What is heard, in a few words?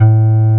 OB8 BLOW A1, OBERHEIM OB8, Vintage Keyboards; Big Fish Audio - First Call Vintage Keyboards